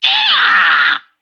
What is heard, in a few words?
vox pain growl